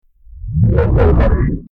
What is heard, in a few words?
time start or stop; FXHome